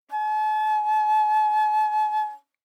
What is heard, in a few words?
Bass Flute ff Ab5; Woodwinds